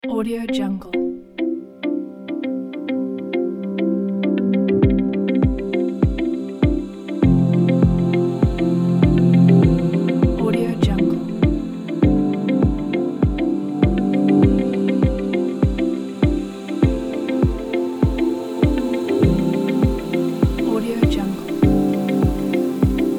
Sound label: ambient corporate